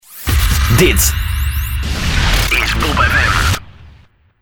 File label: jingle